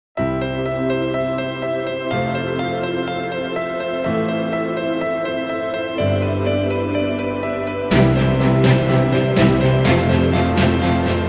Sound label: Piano Motivational, corporate music; corporate music vol 15